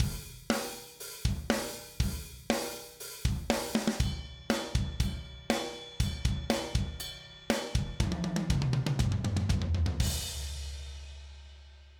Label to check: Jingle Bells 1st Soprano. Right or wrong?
Wrong